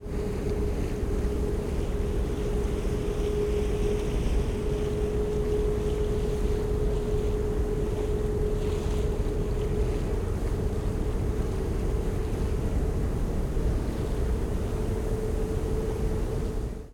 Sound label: Boat, Bow Wash, Ferry, Midship, Whine, Deep, Slosh